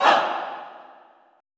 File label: ALL HAH, SHOUTS, Choir; Best Service; Extended Classical Choir